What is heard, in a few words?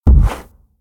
Footstep From Monster Dinosaur; Hollywood Edge; sound designer's toolkit v2